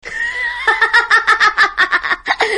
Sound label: huhaha